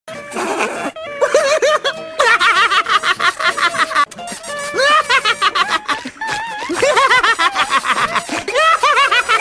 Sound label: Smeh, Hahahaha